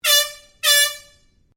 AIRHORN LARGE BLAST SHORT DOUBLE, Compressed air horn; Sports